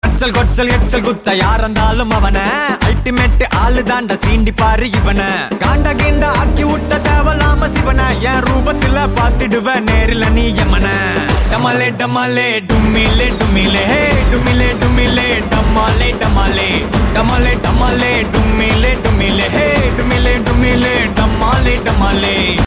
Ringtone

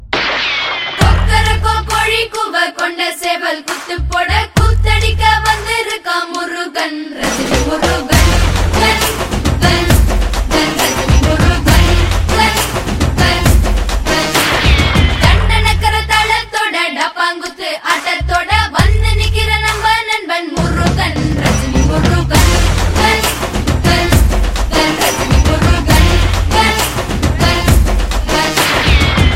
Karaoke; Rajini Murugan

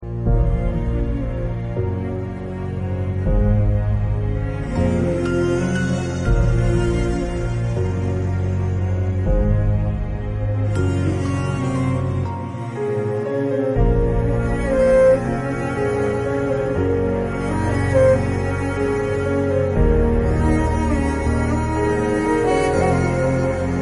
Karaoke Ringtone

